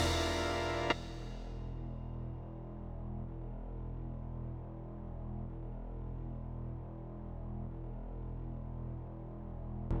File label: Explosion; Banjo Tooie